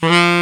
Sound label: TEN SCP F 53, TENOR SAX; Northstar - New Gold VOL-1